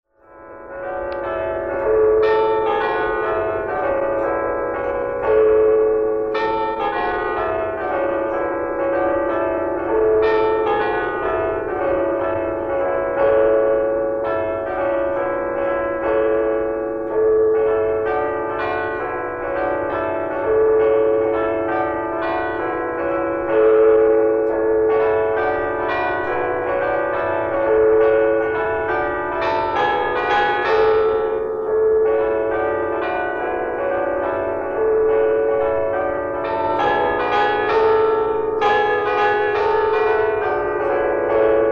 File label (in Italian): Pastorale, campanari